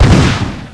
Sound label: m2 shotgun firing